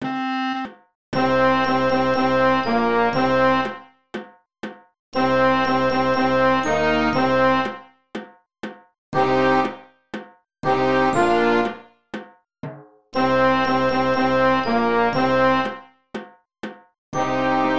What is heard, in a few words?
Rondo for Band; Instant Success Music for First Year Band and Strings